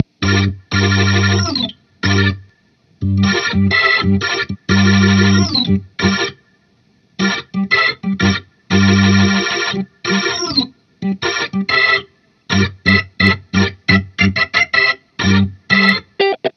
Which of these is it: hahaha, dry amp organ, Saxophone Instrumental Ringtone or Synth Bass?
dry amp organ